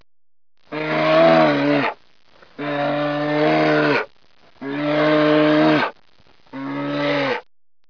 Bear Growl

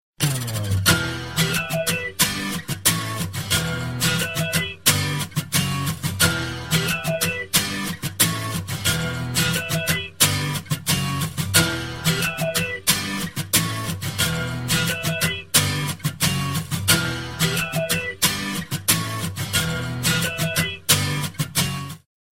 gitar

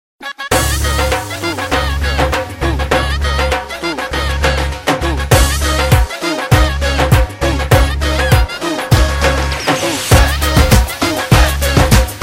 Dhol

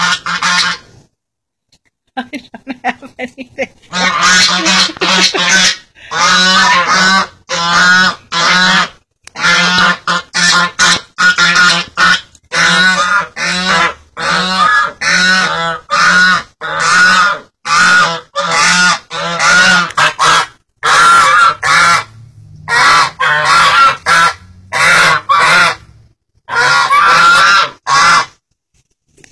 Geese

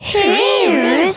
Distorted hiss

